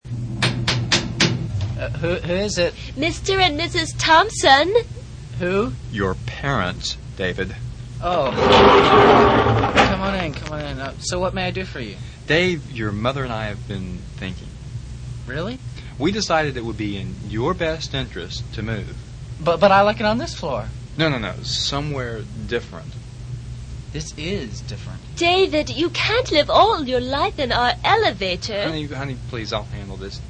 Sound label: elevator; Family Elevator; Comedy on WEGL